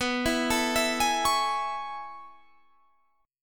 A Major 7th B; arpeggiated; JGuitar

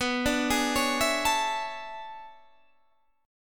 E 13th B

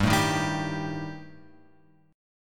G Suspended 2nd Sharp 5th; Gsus2#5; {3 0 1 0 X 3} strummed

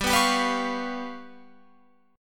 G 7th Flat 5th, Guitar, Open F; G7b5 strummed

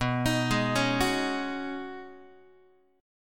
B 6th Add 9th B down Guitar; B6add9 arpeggiated; 4A,4E,5A,5D,5G#,6C#; chord shape; x,7,6,6,5,5; JGuitar

